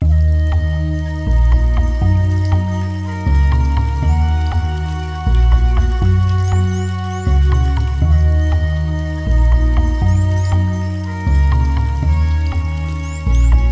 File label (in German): MUSIK; MOBICLIC 84